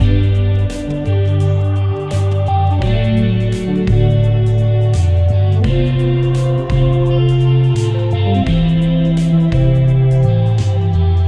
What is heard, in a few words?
buttery loop